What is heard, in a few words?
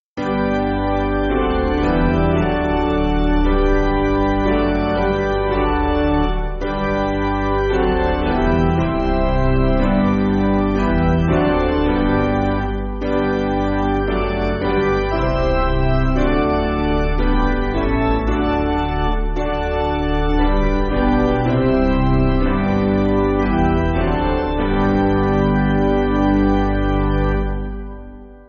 Piano, Free Music Backing Files for Churches; Rockingham